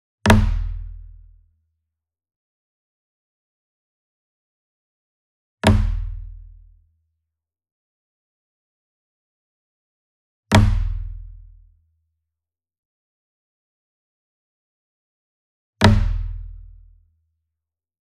SINGLE DRUM Kodo Flam Hard Beater Near, Cinematic Strikes; boom library; Cinematic Strikes Construction Kit